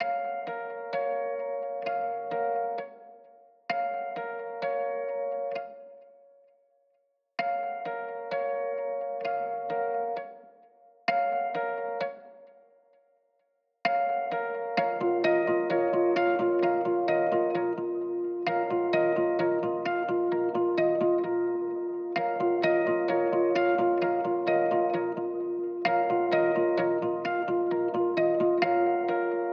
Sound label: 130 BPM A# Min Guitar; Cymatics; Different